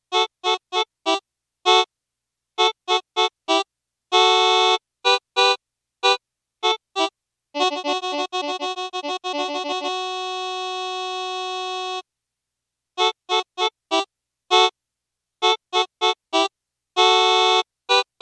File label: lead in D96